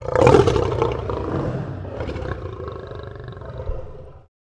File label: Lion